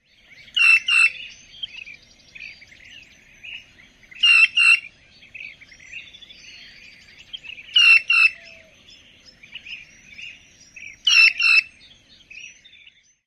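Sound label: Green Jay piping calls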